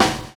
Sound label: 60 S BEAT; Univers Sons - Kitch 70s